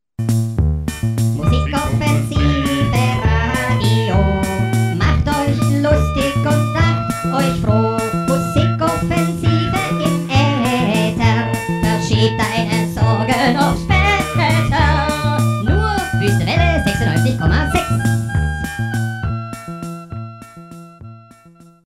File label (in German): musi jingle